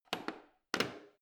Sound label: plastic button creak defect press